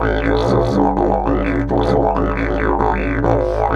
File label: 130, Didgeridoo; Big Fish Audio - Didgeridoo and Other Primitive Instruments; 130BPM